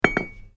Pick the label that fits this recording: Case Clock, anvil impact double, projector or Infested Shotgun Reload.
anvil impact double